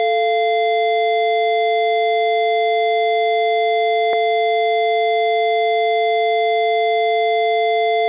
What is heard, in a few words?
sine pwm